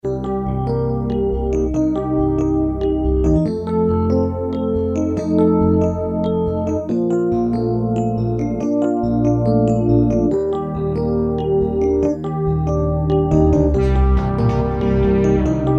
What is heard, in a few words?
Some frutiger aero themed tracks